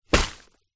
food bunya nuts many in plastic bag down on surface; zapsplat